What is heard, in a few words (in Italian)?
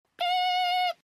Doppio Beep